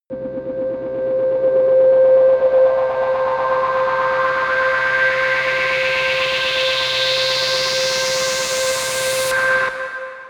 rise hum whir high

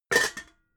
pots hit

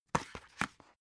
dvd case remove booklet

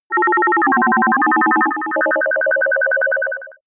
telegraphic synth

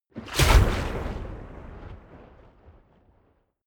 waves splash hard

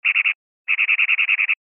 bird twitter

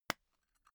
tobacco tin open pop

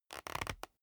leather creak short soft

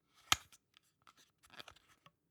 plastic hook adjust

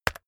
keyboard external key hit very fast

